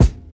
punchy bass drum single hit b key, Royality free kickdrum sound tuned to the B note; kick drum samples